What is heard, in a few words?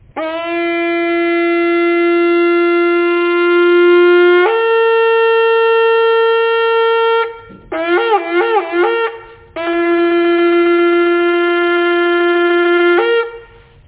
Shofar; Selichot